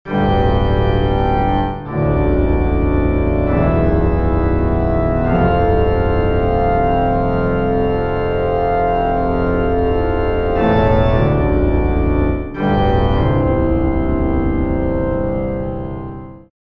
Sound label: Dark Dissonant Pipe Organ; Organux; Syntheway